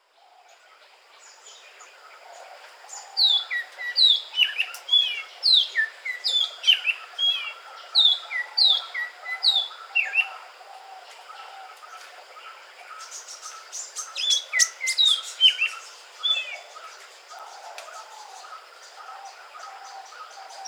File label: Magpie Robin Weddagala